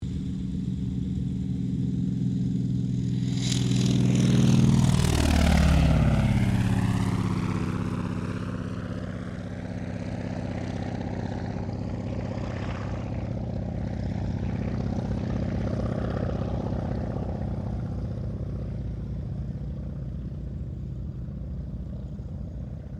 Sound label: AIRCRAFT CAC WIRRAWAY TAKEOFF; CA-16 Wirraway; Airplane; Vehicles; Airplane Sound Effects